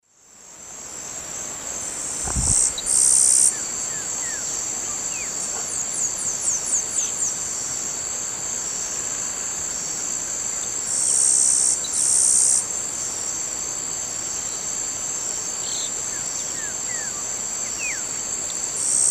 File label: Ammodramus aurifrons, 2 song types; S. America; Eastern Lowlands